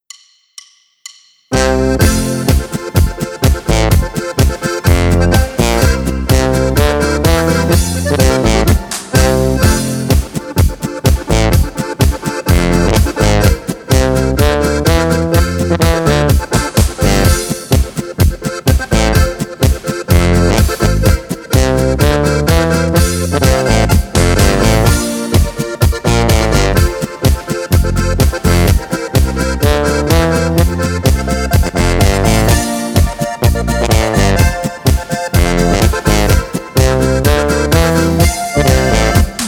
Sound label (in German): G C F B ohne Harmonika Lead ohne Chor